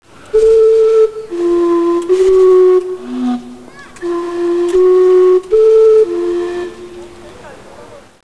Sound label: steam clock, turmuhren